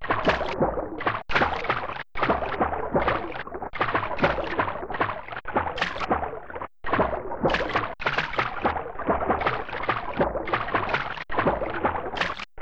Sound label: erase; super mario paint